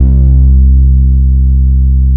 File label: bass, 135 BPM, drum & bass; Ueberschall; Drum & Bass Flash Trackz